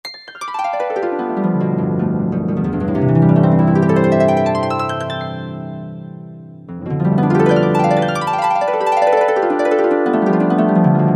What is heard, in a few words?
Real Harp Gliss; Korg TR-Rack